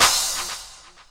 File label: CLAP HOU, Dance Mega Drums; Best Service